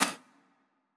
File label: Chicken Spit